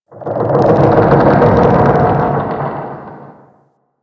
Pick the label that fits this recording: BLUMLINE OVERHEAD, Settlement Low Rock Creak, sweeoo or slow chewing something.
Settlement Low Rock Creak